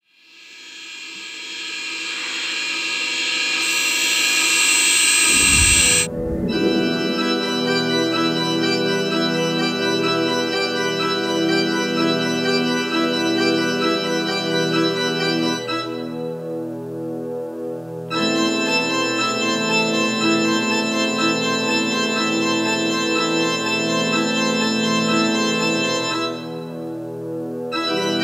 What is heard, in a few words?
MOVIE MUSIC